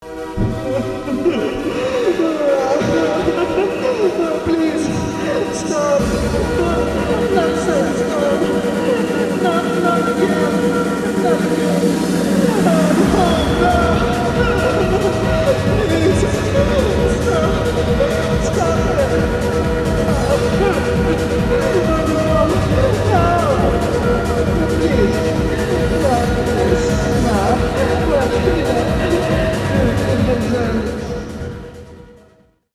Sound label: ringtone